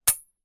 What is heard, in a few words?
household knives large hit together